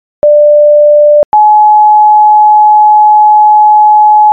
Motorola Tone

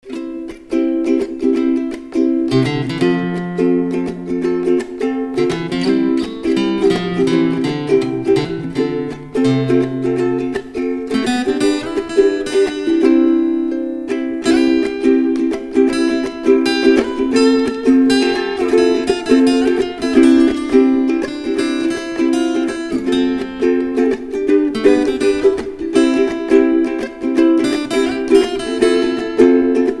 Guitar with Ukulele

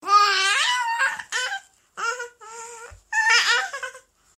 Baby Sounds

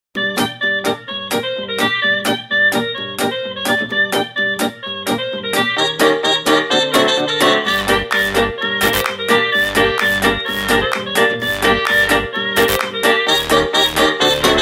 Electro Funk

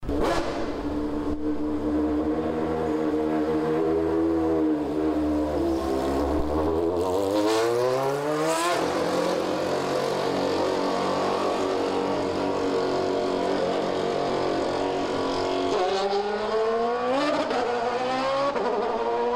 Porsche Rs Spyder

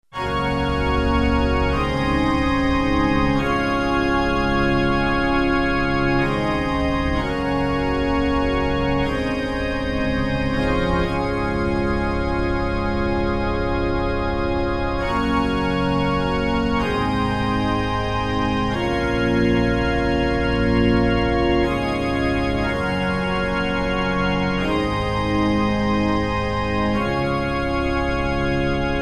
Church Organ

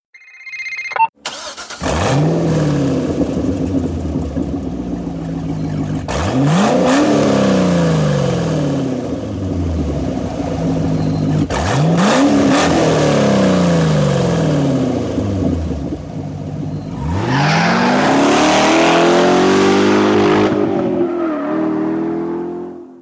Muscle Car

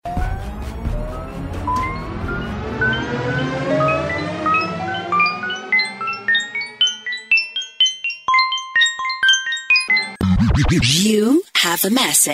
Go Fast Sms

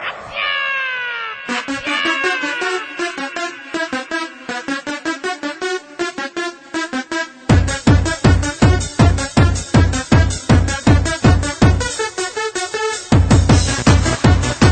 Techno

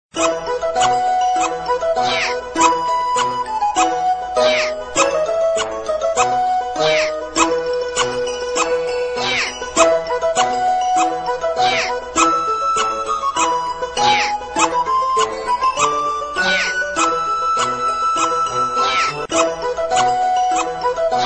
Nyaa

